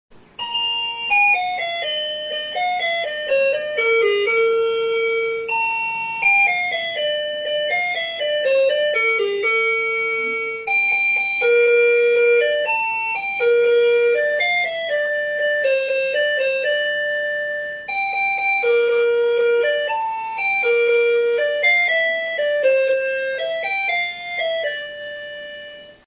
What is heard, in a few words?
game bgm; subway surfers